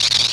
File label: ls block, weapons